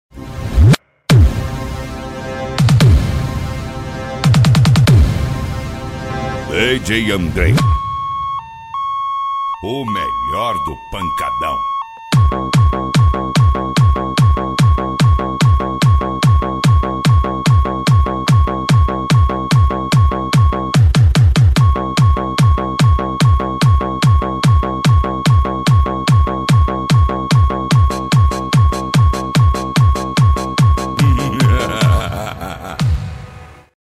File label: pancadao funk bass